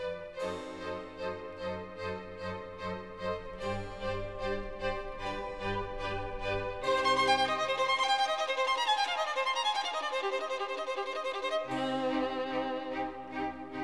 Violin Concerto; The 4 Seasons; Violin Concerto in F minor Op 8 No 4 RV 297 L inverno Winter

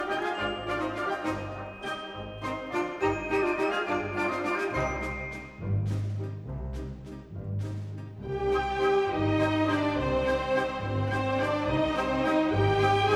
Jazz Suite Waltz, Symphony Orchestra; Jazz Suite No 2 VI Waltz 2

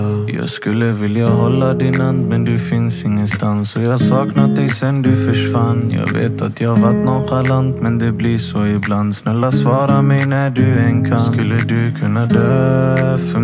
Acoustic

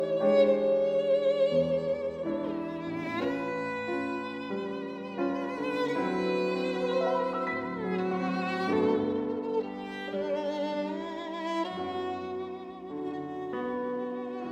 Violin and Piano; Arr. Pappano; Antonio Pappano

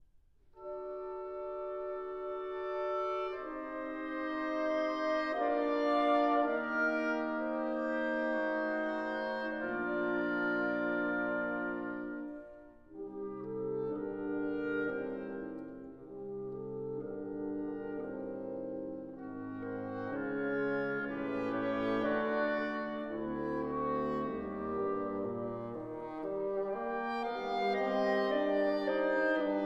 Violin Concerto in D Major Canzonetta Andante; Eivind Gullberg Jensen

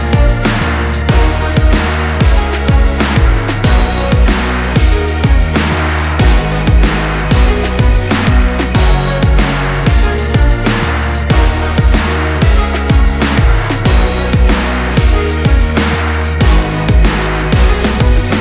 Instrumental

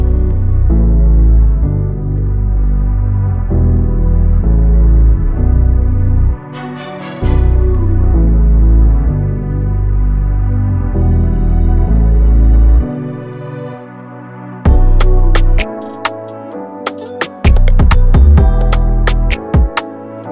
Regrets Instrumental

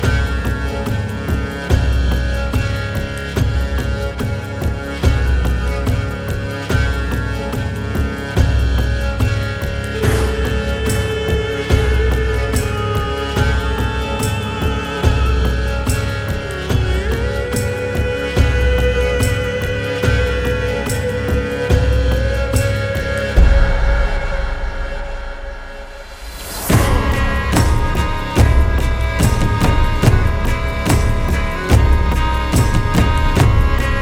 Viking Music

